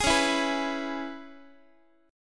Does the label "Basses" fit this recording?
No